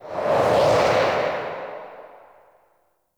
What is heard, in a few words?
Reaper Travel Whoosh Right; Warframe; Wraith